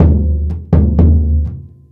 Percussion Loops, Brazilian; Houseworx

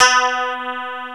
STRAT C5, K1 KAWAI; East West; Global Sounds

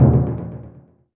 Timpani Roll, Percussion

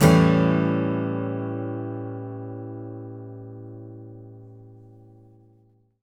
ACCGTR 7TH; Country

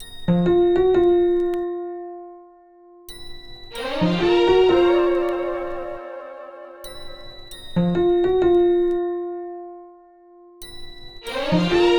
Melody

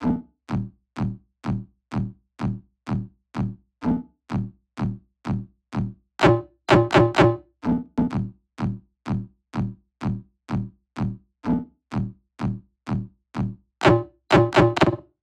loop bass 126; amphitrite; Seismic Loops; Bonus Bass; SEISMIC2

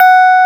PUNCHREEDS; Wizoo - Powered DX

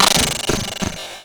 Bent Odd, glitch kit

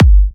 G, Kicks; markus hakala

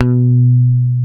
PICK B 3, Bass; Spectrasonics - Bass Legends CD 2

